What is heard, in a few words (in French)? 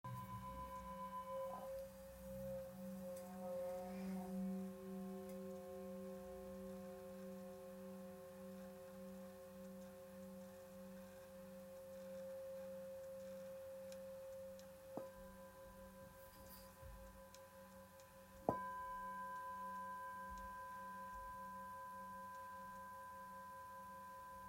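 Bol therapeute fa diese, bol chantant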